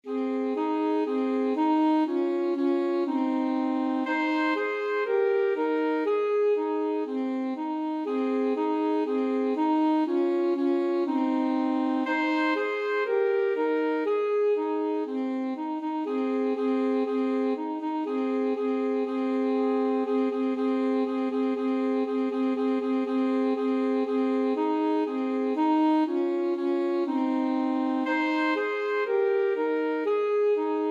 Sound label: alto saxophone duet